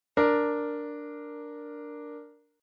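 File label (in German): Intervalle übermäßige Quinte D; Reine Verminderte Uebermaessige Intervalle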